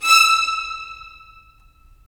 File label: STR VLS, Violins; Violins Sh; Roland - LA Composer vol. 4